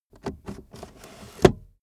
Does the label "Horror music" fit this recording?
No